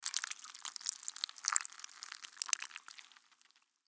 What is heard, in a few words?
Horror Blood Spill Water Liquid Drips; Injury; Combat; Blastwave